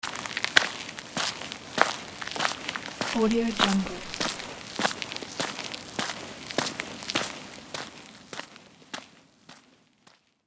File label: footsteps walking on gravel